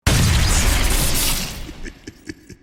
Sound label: sniper shoot